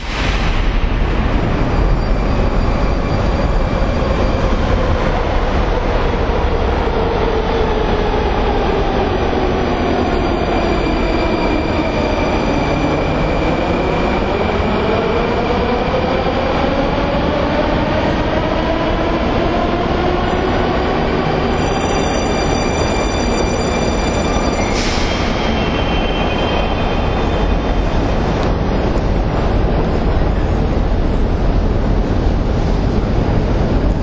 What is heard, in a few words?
metro